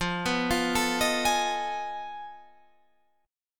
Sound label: F 7th Sharp 9th Flat 5th F down Guitar Half Step Down; F7#9b5